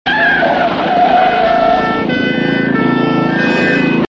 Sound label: tyres screeching and police siren